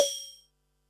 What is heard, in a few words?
HH, Pearl SC-40 Hihats and cymbals